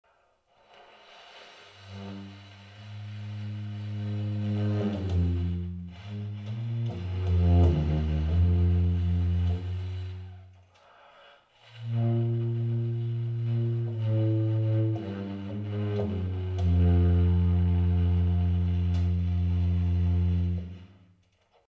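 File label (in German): bar, Subtone, Subtone als pp-Spiel im tiefen Register. Bariton; saxophon; spieltechniken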